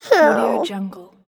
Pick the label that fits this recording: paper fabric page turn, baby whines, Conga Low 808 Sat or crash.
baby whines